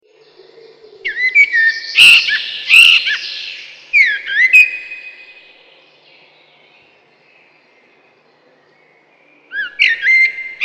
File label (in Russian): дрозд черный, birds